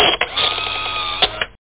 camera

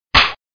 smack

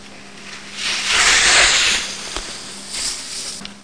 slide ski; My Science Fair

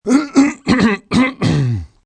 Hum; Mobiclic51

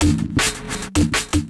DRUMLOOP D+B 160, Drum+Bass Loop; eJay

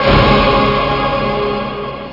Orch!

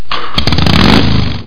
BMW; HDStart

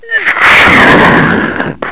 sink; VORTERRA

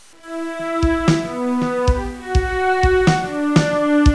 cello

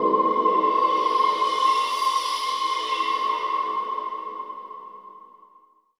sphere ambient 160

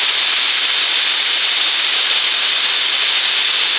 Air Steam

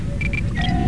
shld up

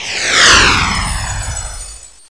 BAT LRG; Breakout

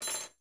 amb lounge misc; Earth and Beyond; EA Games

